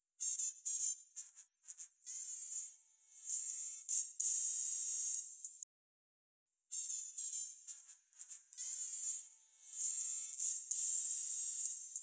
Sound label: with 8k 10k filter